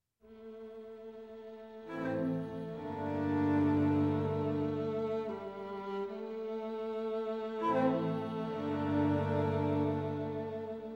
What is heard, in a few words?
String Quartet in F Major, Très Lent; M. 35